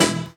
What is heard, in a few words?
Synth Shot E